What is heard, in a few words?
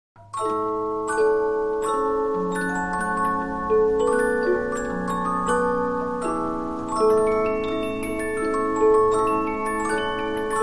Polyphon, 15inch disc musicbox